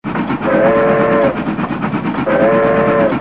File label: train whistle